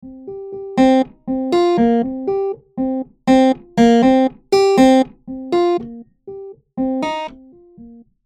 Random Harp, Applying random velocities from 0 to 127 on our harpsichord lick